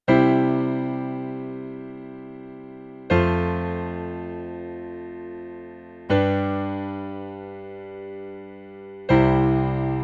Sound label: piano flat tuning tempered